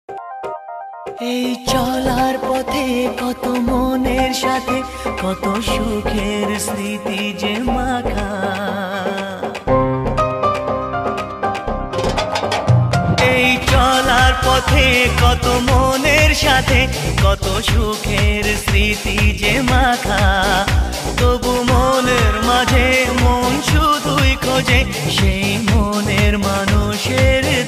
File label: Bangla Song Ringtone